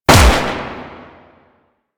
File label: Fire; Warframe; Weapons; Gotva Prime